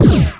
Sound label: ion pulse